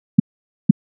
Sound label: 200Hz Blackman 200Hz Cosine 4 cycles